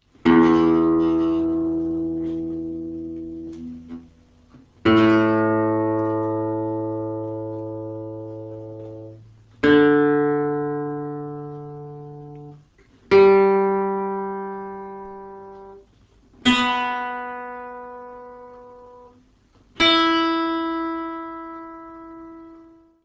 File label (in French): accordage